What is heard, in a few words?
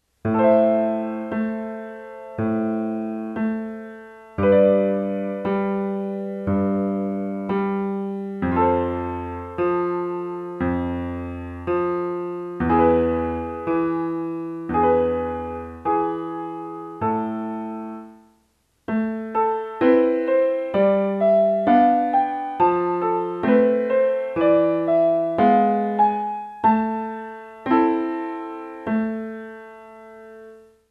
Figure andalusian, Chord progression